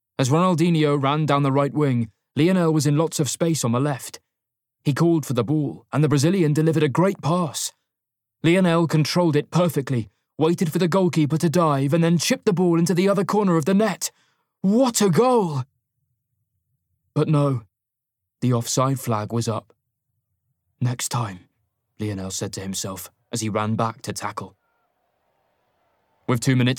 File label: Goal Cheer Stadium; Messi